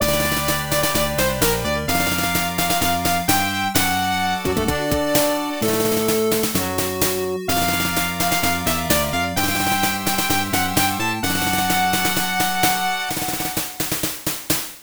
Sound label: Unused Fanfare; Tel-Tel Mahjong; Genesis